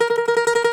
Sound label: DOMRA, Russian Strings